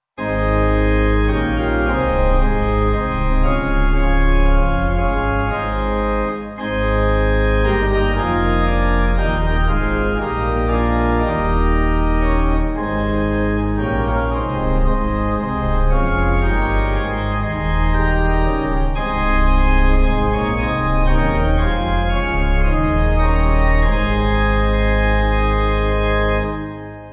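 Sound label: Pipe organ, church music backing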